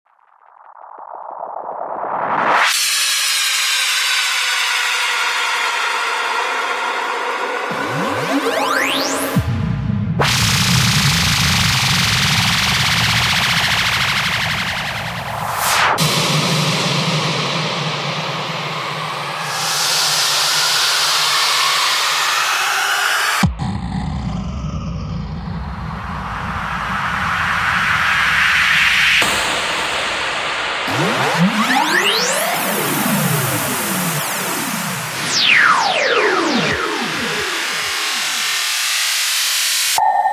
cosmic sweeps

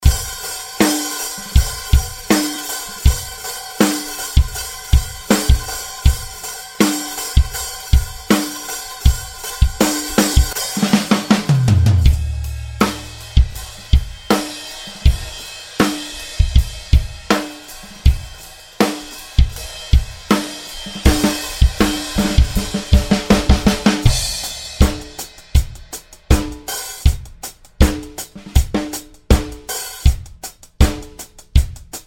LIVE POP DRUMS

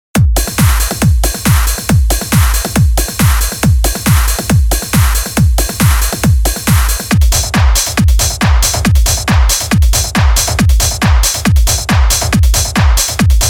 trance drums

